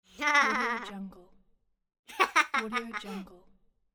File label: cartoon laugh